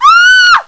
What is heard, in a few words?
scream